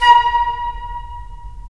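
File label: FL, flute; LA Composer vol. 3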